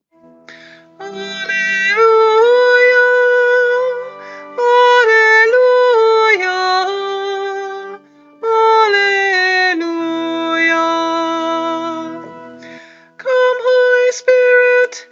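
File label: Gospel Acclamation; Confirmation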